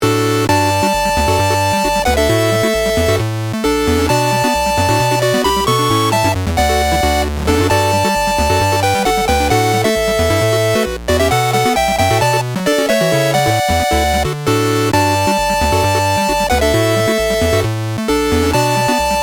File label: Starry Conversation Ringtone